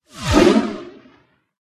dragon mage attack voice up

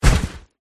mage female attack contact bottom

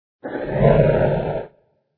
fire attack voice up; krofdor

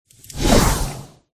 dog

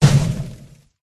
witch attack contact up

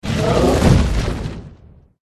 defender attack voice bottom

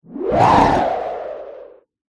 polar bear

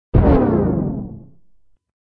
dragon mage buff out

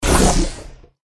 jelly fish boss wound

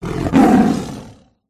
tiger attack voice bottom

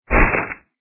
ant attack

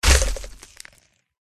zorb attack contact bottom

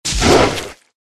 Twoheaded Dog Wound

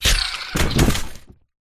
Rat die

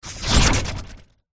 mage attack voice bottom

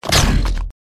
Scolopendra BLOCK

